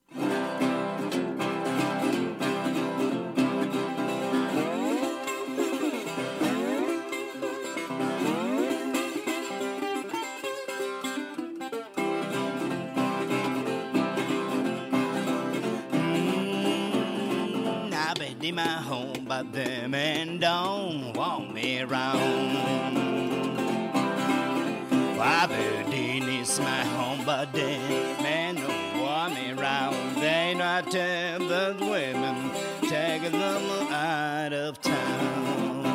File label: blues